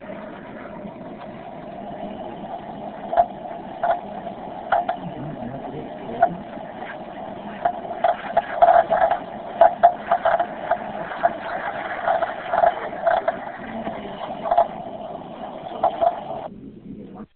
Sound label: optima creaking noise